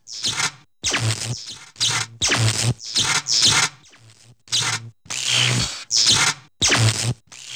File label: tilt; quake2